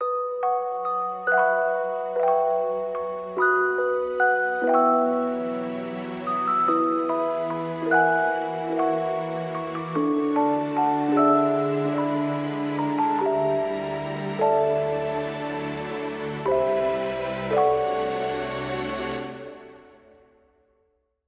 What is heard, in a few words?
Intro Sleeping Scene Music; A Hat in Time